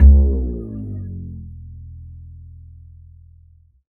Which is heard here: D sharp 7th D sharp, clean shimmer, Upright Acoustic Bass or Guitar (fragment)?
Upright Acoustic Bass